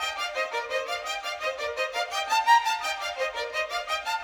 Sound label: Violin